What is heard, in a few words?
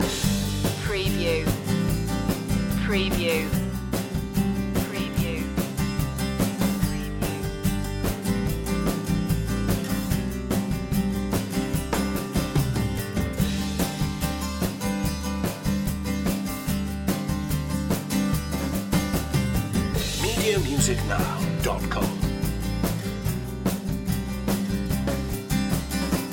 mandolin road loop